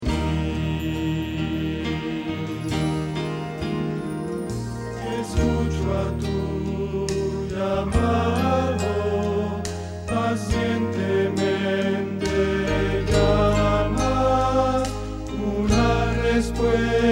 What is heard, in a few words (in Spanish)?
Bajo